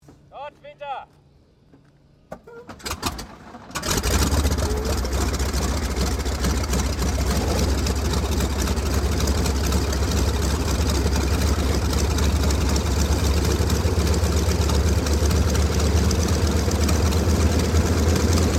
AIRCRAFT YAKOVLEV YAK-52 STARTUP, Russian Yak 52 Training aircraft; Airplanes; Vehicles